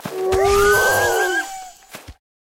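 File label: Pet werewolf buff; Oboroten; oboroten lvl4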